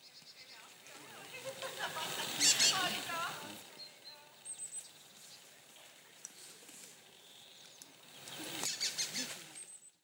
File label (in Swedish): svarthalsad trast; fågelljud